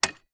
household mains fuse box switch on; zapsplat

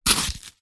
foley polystyrene foam rub scrape short; zapsplat

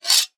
warfare sword draw from sheath fast; zapsplat

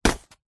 foley footstep single barefoot walking cardboard; zapsplat

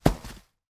impact log branch fall hit forest floor

